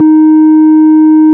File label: ORG, Farfisa